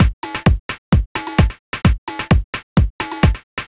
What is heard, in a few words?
Beat (130BPM), techno; techno drum fx